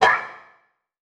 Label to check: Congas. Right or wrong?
Wrong